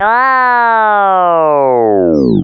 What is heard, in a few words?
death; opentdm; bunbun